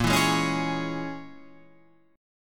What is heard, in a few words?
A sharp Minor 7th Flat 5th A sharp, Guitar Half Step Down